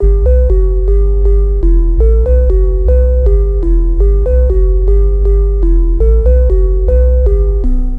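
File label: 120 E minor BASS; TREV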